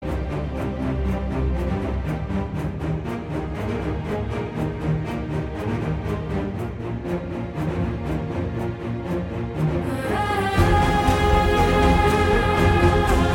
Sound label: Metal